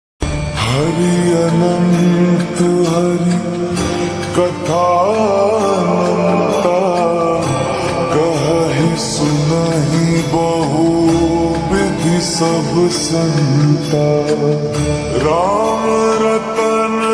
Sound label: Slowed Reverb; Ringtone